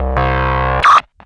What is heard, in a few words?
BLURP LOW, Hard & Loud Techno; USB Soundscan vol.01; AKAI